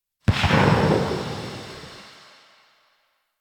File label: Saw Blade Launcher Tail; Warframe